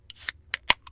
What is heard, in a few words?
bubblegum; svencoop